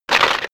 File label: stone gravel clatter; industrie-wirtschaft; baugewerbe